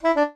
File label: sax jingles; kenney musicjingles